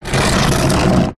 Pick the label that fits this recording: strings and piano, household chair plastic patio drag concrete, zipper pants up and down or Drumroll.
household chair plastic patio drag concrete